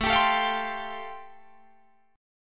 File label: A-Minor 7th Sharp 5th, Guitar Drop D, chord